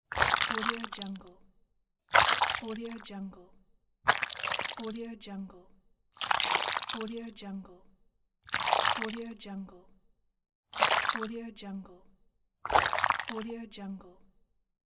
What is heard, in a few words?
guts short burst; audio-therapy